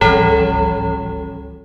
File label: Pickup Secret